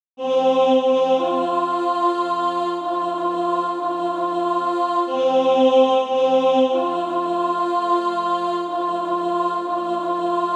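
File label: alto; Falter Not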